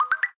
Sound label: upload; Harvest Massive Encounter